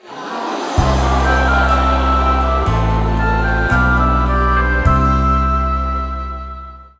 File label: Wrong; Dinox; Wii U